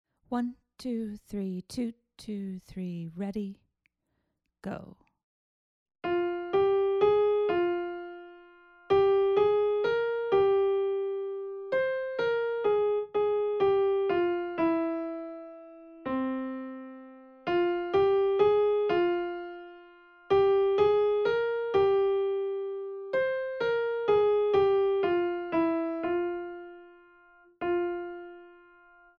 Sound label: melodic dictation